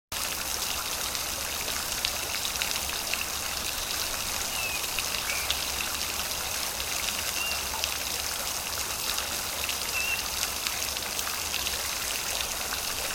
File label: Garden water feature in summer with bird sounds; Nature; Garden Fountain